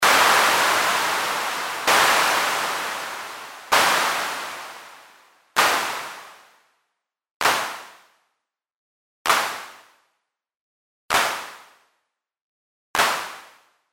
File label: Noise to clap